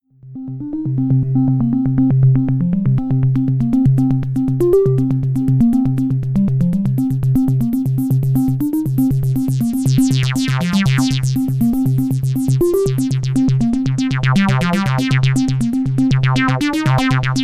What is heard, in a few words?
cs60 Solo